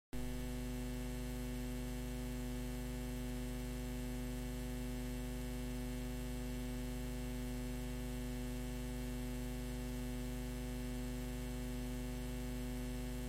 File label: Morning Noise Broadcast